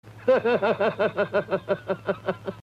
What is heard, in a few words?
hahahahaha